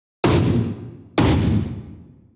gun

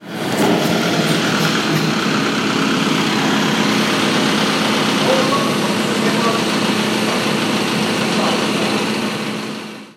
kouji

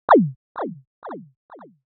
erase

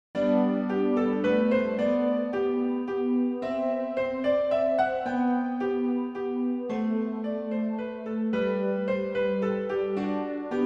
menuet; Classic

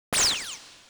laser

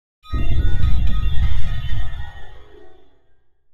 ghost

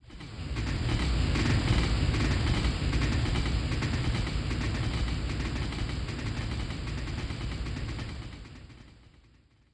train

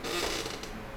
chair; SE

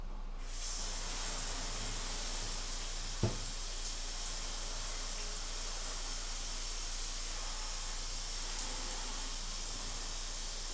shower

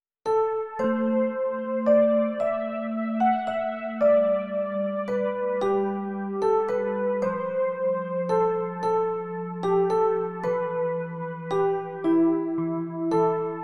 Bell Vibraphone; minyou; Classic; greensleeves

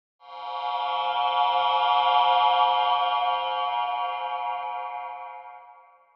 hollar

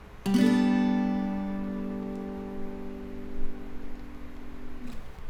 Gadd9, guitar; SE

